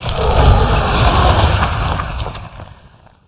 Rock Elemental Death

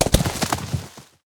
Four Hoof Small Death Underlay

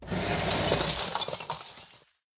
Drawer Underlay

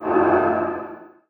Holy Magic Death Underlay

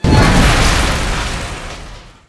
Fel Cannon Death

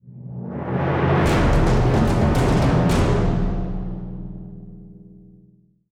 Stinger Beast Villain

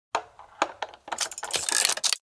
Lockpick Underlay Play

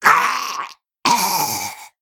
Death

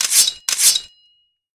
Swords

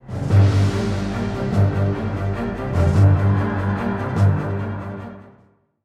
Stinger War; Pegasus

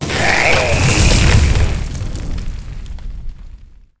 Volcanic Lumberer Death

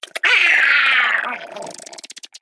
Male Mantid Death

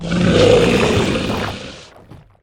Moss Elemental Attack

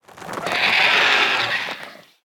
Many Whelps Death

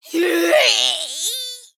Shrieking Shroom Death

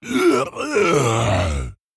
Death

